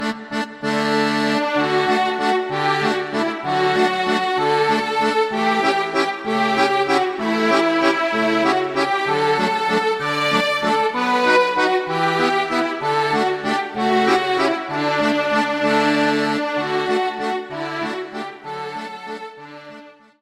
Dutch Windmills, Pays-Bas

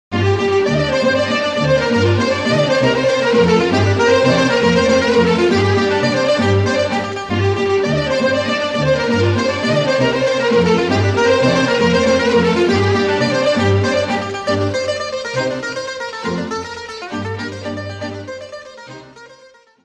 Trakijska Racenica, Bulgarie

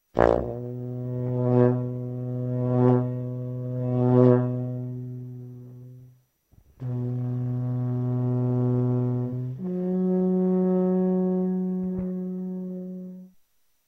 dung chen; instruments

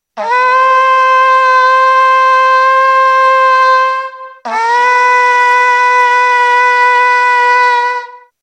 rkang gling; instruments

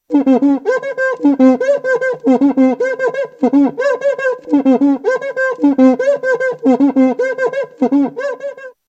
cuica; instruments

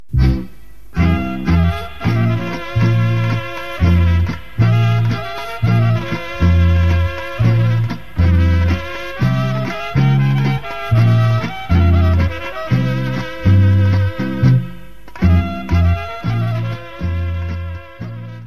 Cocek; Macedoine

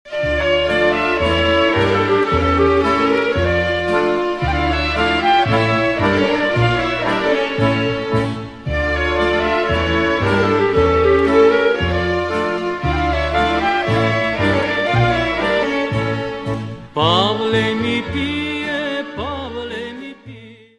Horo; Bulgarie

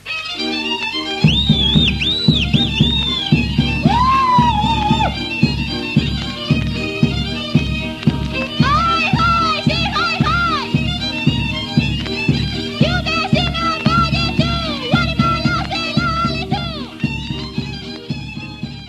Oas Dance, Roumanie

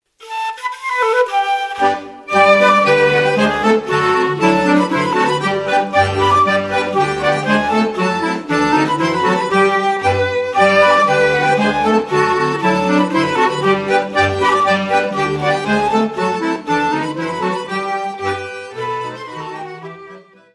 POLKA; Belgique

